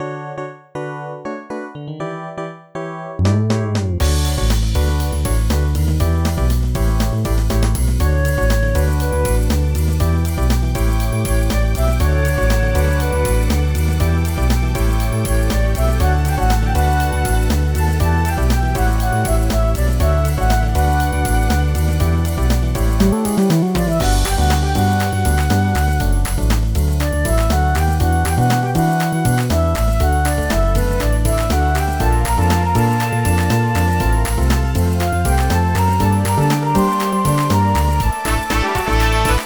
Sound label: pop; WorldsChat